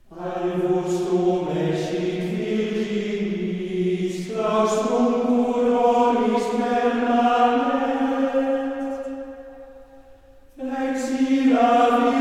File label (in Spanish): silabico